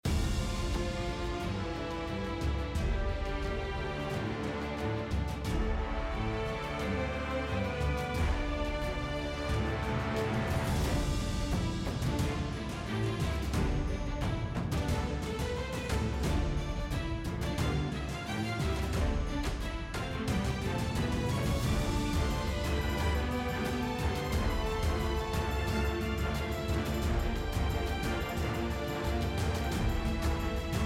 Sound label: Battle